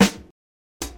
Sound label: large room steel snare drum sample g key, Royality free snare tuned to the G note